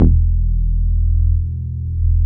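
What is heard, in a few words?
WAVE B E1, ANALOG BASS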